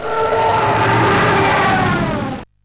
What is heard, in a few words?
intro sid MOS6581R4; Hugenroth Andre and Frank; Boom intro